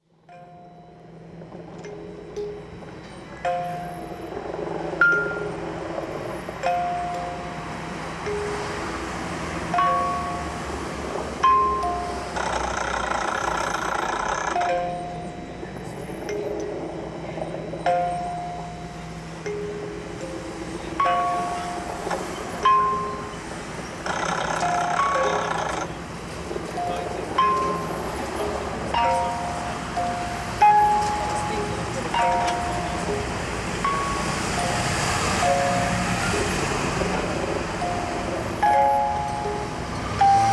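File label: Music Box MIX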